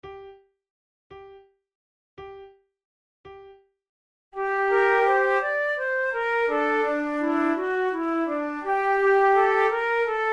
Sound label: flute trio largo; scherer